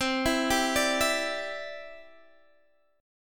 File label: C Major Add 9th C down Guitar Half Step Down